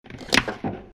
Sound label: door lock